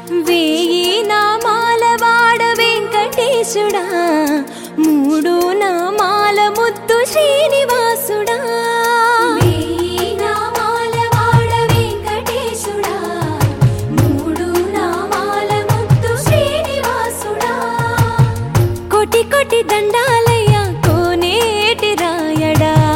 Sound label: Telugu Ringtone